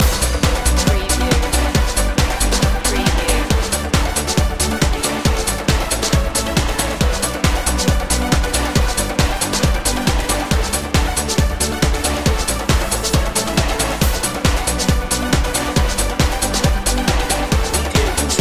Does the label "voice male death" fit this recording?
No